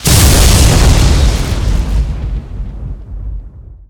Explo Large Electricity; Destruction

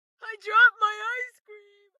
town human road; Blind Drive

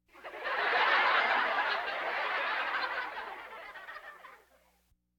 Laugh tracks

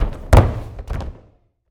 Boxing Ring Bodyfall

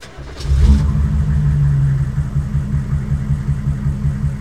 Humvee Start Idle Loop; Trucks

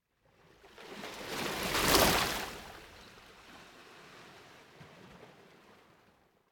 Ocean Gentle Water Lap On The Coast Surf; Hollywood Edge; sound designer's toolkit v2

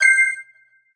Pickup Gem Bells; SFX Kit; Pickups

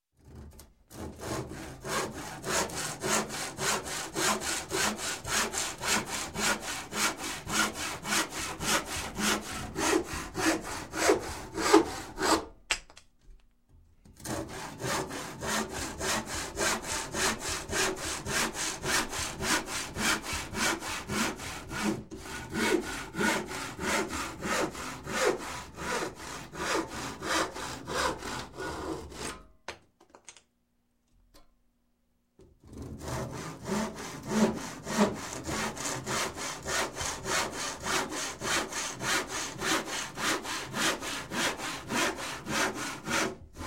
Construction, Saw Hand Saw Cutting Wood; Sound Ideas 2000 Series

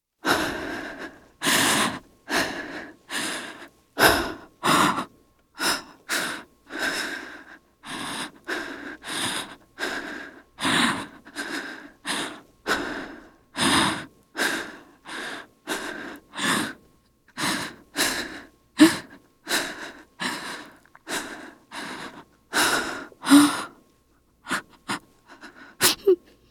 Breathe Female Scared; Pitch Black - A Detective's Demise

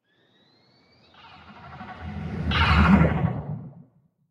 sea animals; Blind Drive

